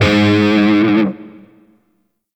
Grineer Shawzin Flourish Oct One G Sharp; Music; DE; Warframe

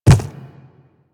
Quadruped Medium Walk Default, footsteps; Warframe; Infested

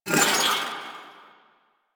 Stomp Shockwave End; Warframe; Caliban

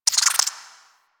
Alchemy Lab Insect; Warframe; Hub; Liset Alchemy Lab

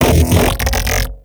Mech Ambient Vocal

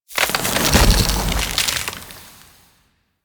Ice Hazard Removed; Warframe; DE; Vehicles; RailJack; RailJackHazards

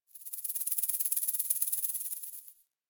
Forest Creature Rattle High; Warframe; Grineer Forest

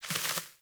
Sparrow Wings Quick Flurry; Animals; Movements

